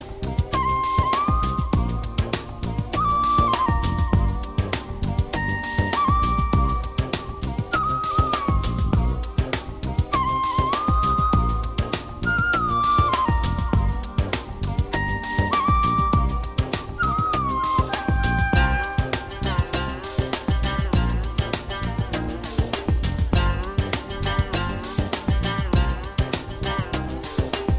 RAAGA FLUTE RINGTONE

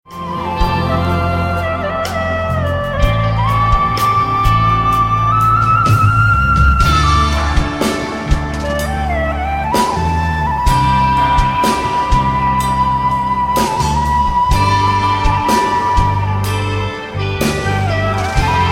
classical flute instrumental ringtone

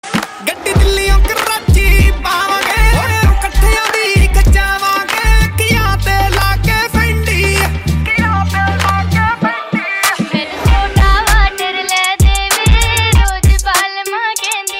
PUNJABI SONG RINGTONE

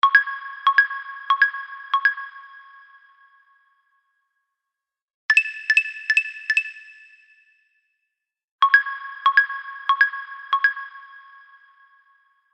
HTC SMS TONE RINGTONE

